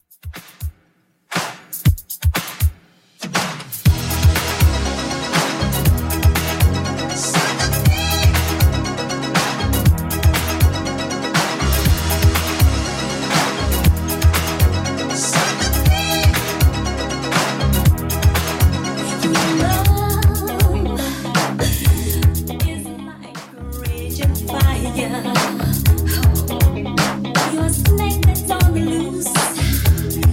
80s Redrum 120bpm CLEAN

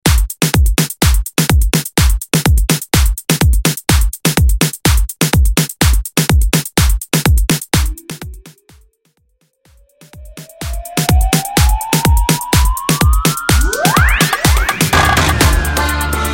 Tribal Mainstream Mix 125 Bpm; Vegas Edit

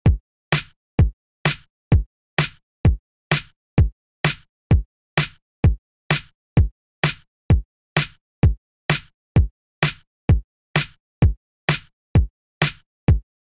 129 Bpm; Dj Intro Outro

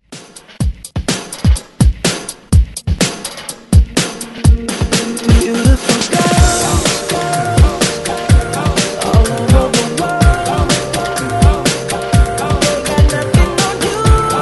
Hype Re Drum 125 Bpm; DJDOLLS

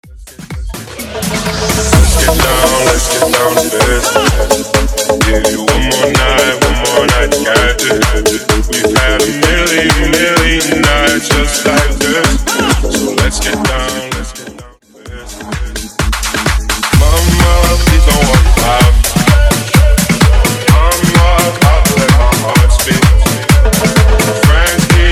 Tribal House 128 BPM; Refix Edit

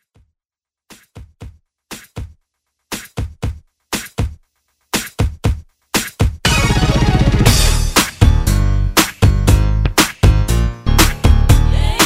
Throwback Redrum

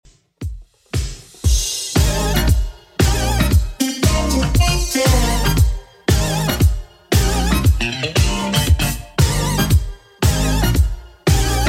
80s Redrum 116 bpm CLEAN

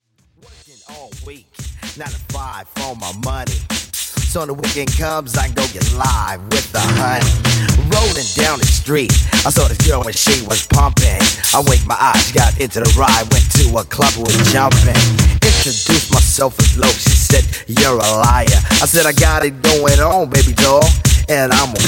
Short Mixes Old School 128 115 Bpm

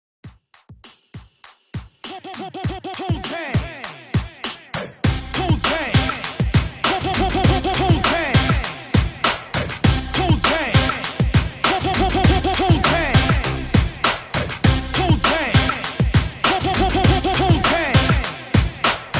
TWERK 100 BPM

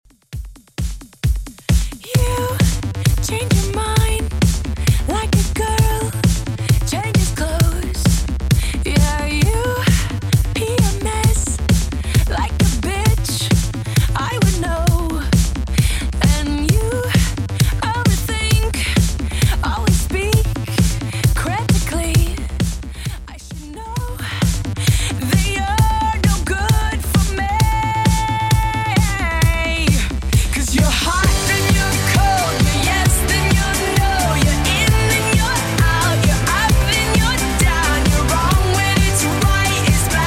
2ks Redrum 132bpm

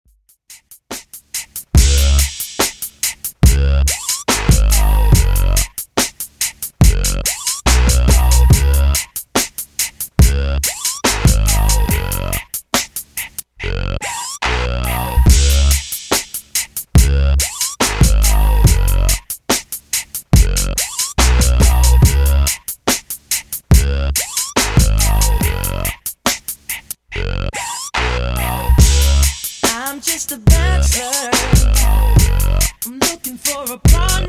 Dj Intro Outro 71Bpm

